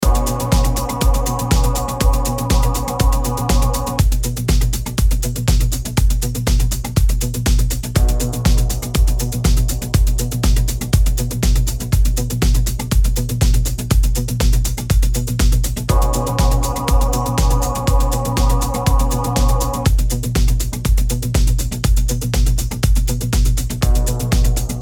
drum remix

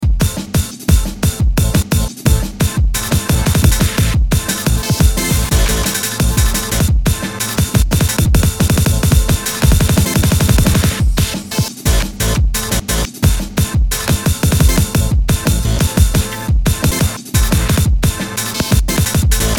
drum and bass remix

